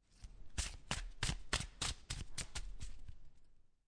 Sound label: Playing Cards Shuffling Cards In Hand; Casinos